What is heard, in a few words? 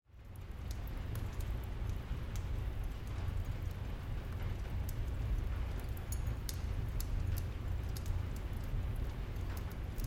Rain meditation; MSB Team